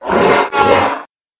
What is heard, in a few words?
monster salamander poison